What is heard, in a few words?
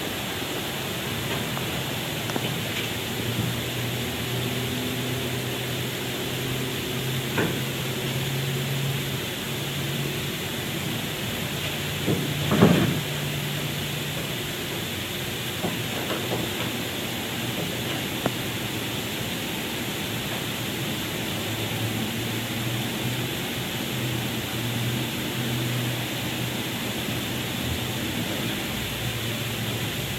loud movement and footsteps, depot